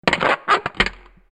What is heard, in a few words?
door lock